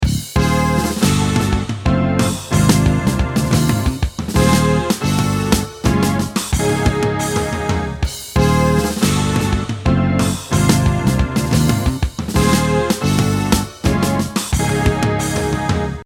Synthaxe

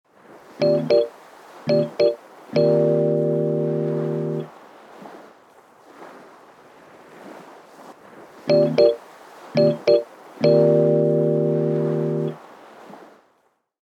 Stereo Image Rhodes

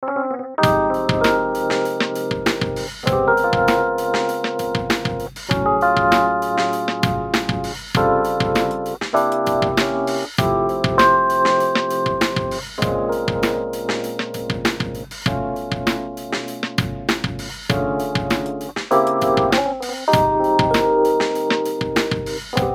Rhodes

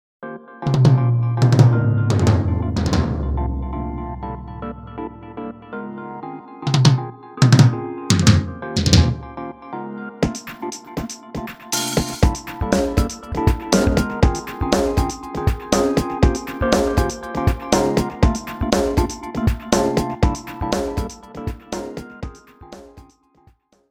Gated Toms

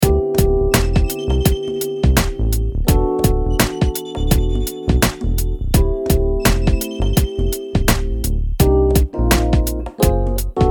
drum

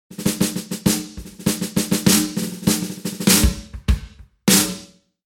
Bright

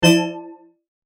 Microtone Analog Syn Pad

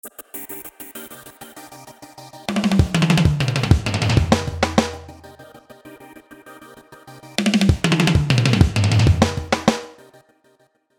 Toms

